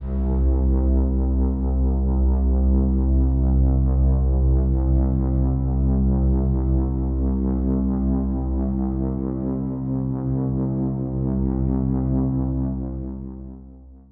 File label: BASS; subatomic v2